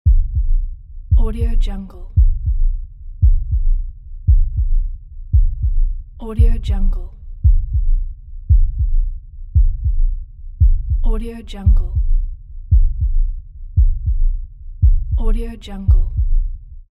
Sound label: heartbeat